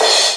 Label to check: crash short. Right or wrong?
Right